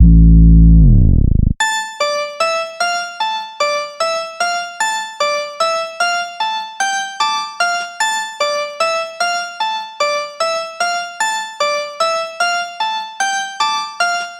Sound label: Peanut butter trap synth lead 75bpm